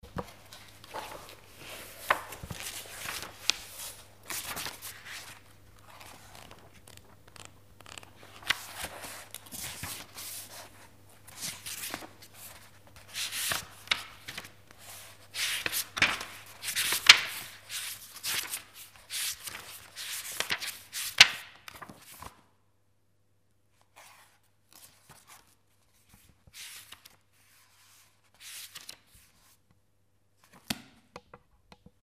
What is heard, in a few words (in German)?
Buch blättern